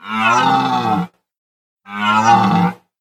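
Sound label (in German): Klingelton röhrender Hirsch Hirschbrunft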